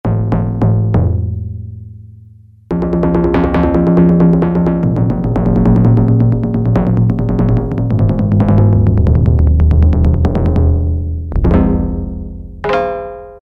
TIMPANI, Yamaha DX7

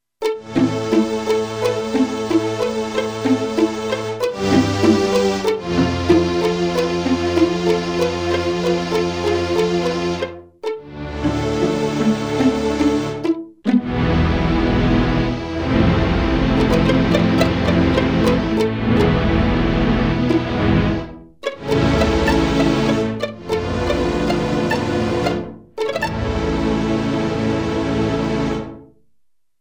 Stkd Strings

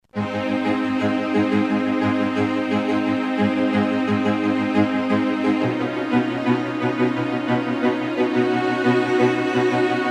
Roland V-Synth; Shakin'Str; Factory Patches

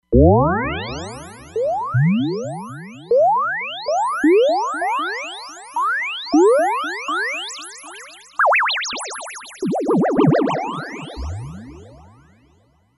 Reso Sweep Up; Roland Fantom XR

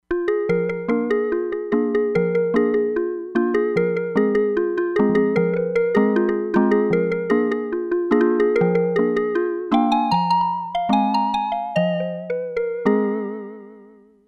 CALIMBA SYNTH PIANO

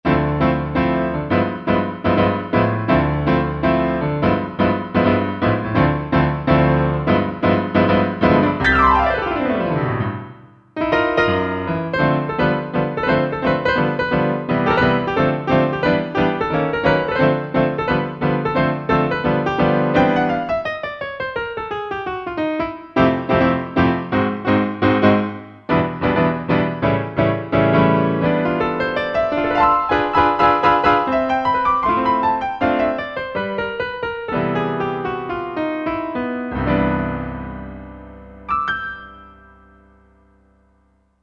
Euro Piano; Roland SR-JV80-03 Piano

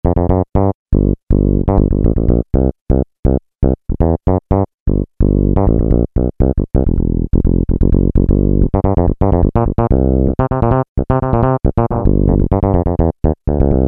Fretless, Peavey DPM Spectrum Bass

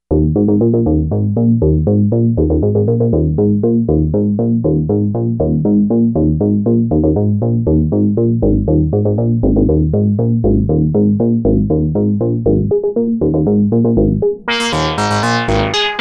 Synthwave Bs

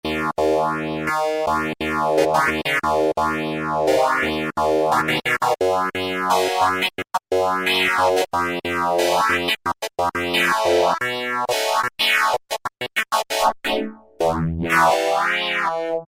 Korg microKORG Phaser Clav; Factory Voices

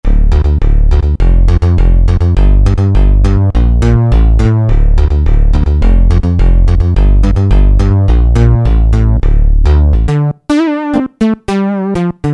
Synth Bass, Roland D-50; Factory preset demos

